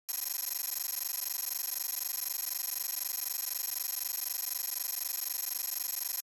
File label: UI Score Counting; Eximius: Seize the Frontline; GenericUI